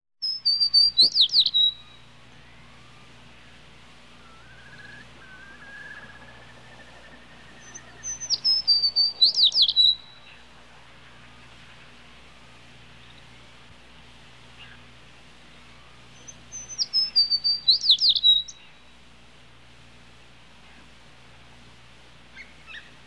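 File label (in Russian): звуки воробьев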